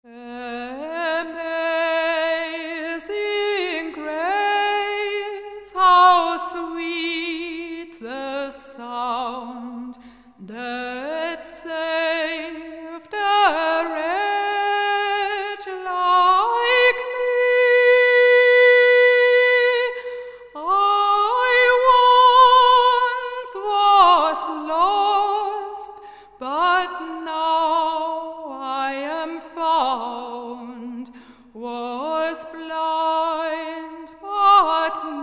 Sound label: amazing grace gospel